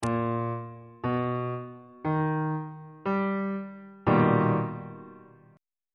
a double sharp augmented major 7th 3rd inversion on bass clef